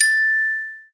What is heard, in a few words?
correct; curiousgeorge